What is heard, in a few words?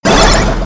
super basket; Nickelodeon Basketball Stars 3; ThrillGames